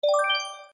arp up; message tones; filling your inbox

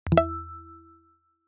for sure

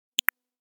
come here notification

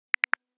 involved; notification sounds

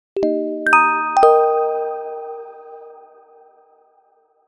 fingerlicking, message tone; notification sounds

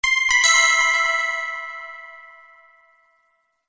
microsong 3 notes reverb; wake-up tones

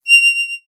thin air, message tones

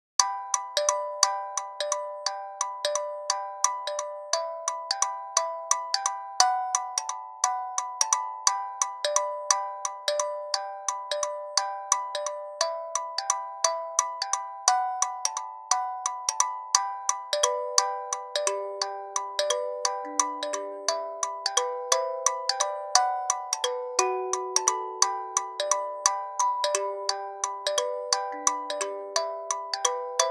swinging; wake-up-tones

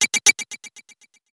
quarrel; standard ringtones

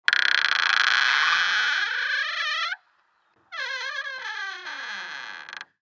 creaky wood door; wake-up tones

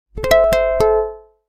this guitar; wake-up tones

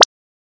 short sweep; message tones

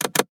armed; sound effects

